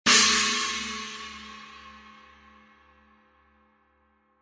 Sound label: 16in China High